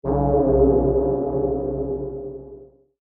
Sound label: Sick Alien; Alien Sound Effects